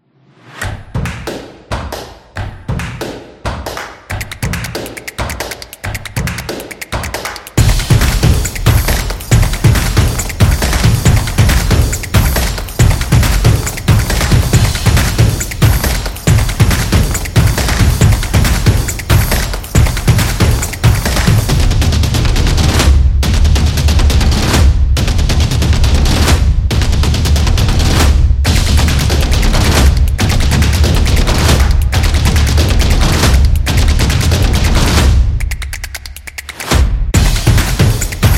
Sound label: Drum Intro